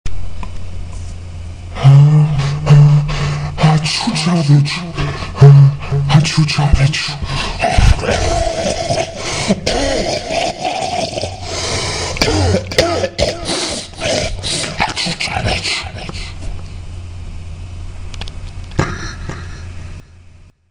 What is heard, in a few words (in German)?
Russischer Bluthusten